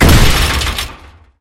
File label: airdrop open